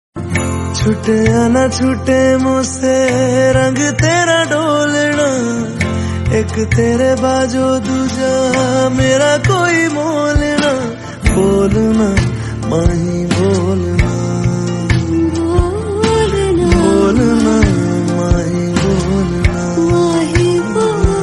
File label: hindi song